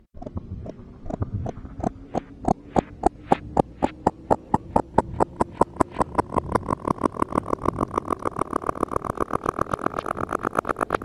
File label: landscape clicks